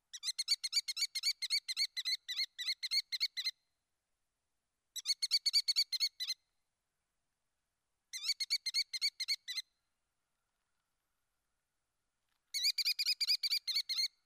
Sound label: American Kestrel